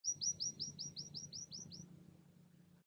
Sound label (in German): Bergpieper Alarmruf